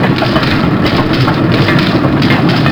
roll metal corrugated; terrains; Tony Hawk's Project 8; XBOX

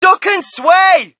crowd, shout; Mike Tyson Heavyweight Boxing

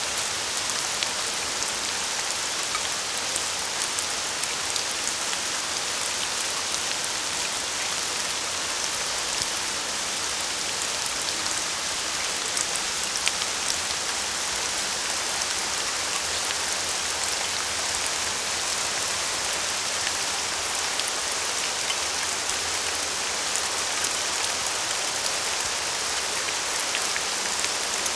rain; Terminator 3 Rise Of The Machines; XBOX

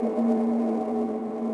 rough sand; Quantum Redshift

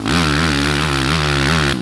Power, Engine

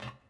metal tube, footsteps

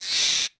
bird; Tony Hawk's Project 8; XBOX

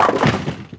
bowling, Impact

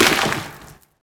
Ceiling Crash small; breakable

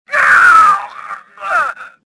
death; Star Wars Jedi Knight II Jedi Outcast; XBOX; stofficer

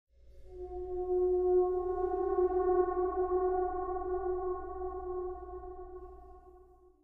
MUSIC

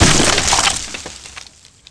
hit palm frond; hits; Tony Hawk's Project 8; XBOX

